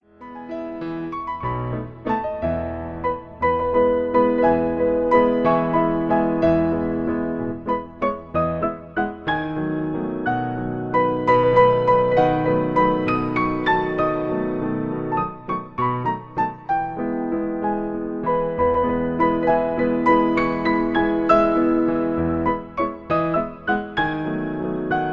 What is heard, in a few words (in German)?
Walzer; Ankunfts-Walzer